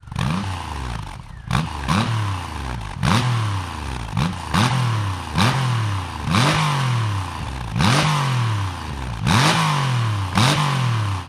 remus custom slash cut slip on exhaust triumph rocket iii roadster